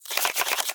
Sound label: Card Pack